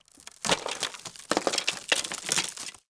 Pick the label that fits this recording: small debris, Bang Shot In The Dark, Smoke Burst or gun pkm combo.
small debris